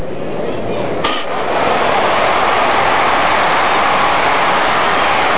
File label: homerun